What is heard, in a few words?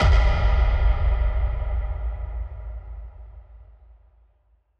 Impact; Cymatics; GHOST (Beta Pack)